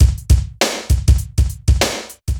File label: Ambience, BPM100; Ambience Loop; AKAI S6000 CD-ROM - Volume 4